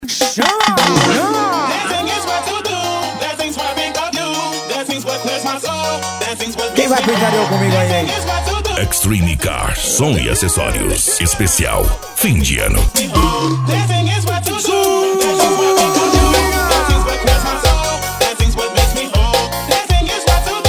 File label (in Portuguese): piseiro e sertanejo